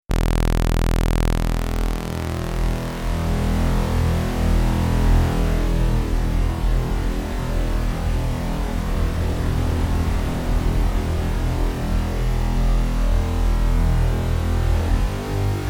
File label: scintillate saw; scintillate by sweet audio